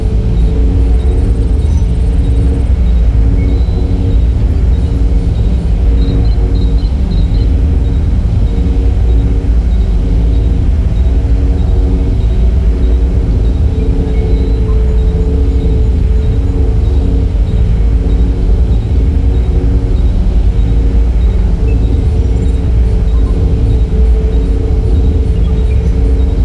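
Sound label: Control Room Ambience Loop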